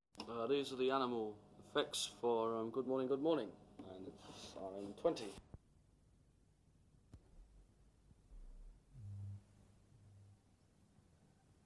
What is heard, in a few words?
Animal Noises